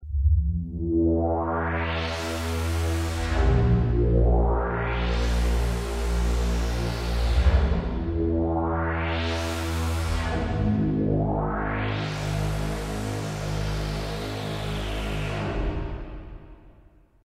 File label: space deep, yamaha sy99 synth; Pad: deep kaos